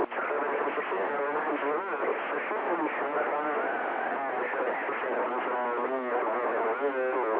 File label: noise